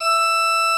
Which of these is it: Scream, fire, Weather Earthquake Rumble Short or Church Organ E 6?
Church Organ E 6